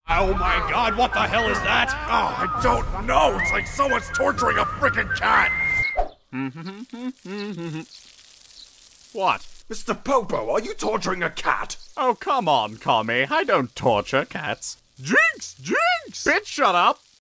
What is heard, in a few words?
Whistling; Torture